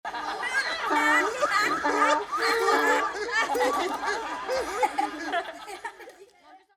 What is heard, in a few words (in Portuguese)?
Risadas Individuais; Colapso Interno Geral